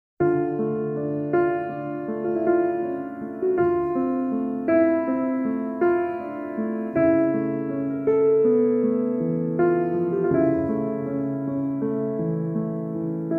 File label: Solitary For Piano